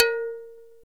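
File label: percussion metal log; Roland LCDP11 Africa VOL-1; Wood & Metal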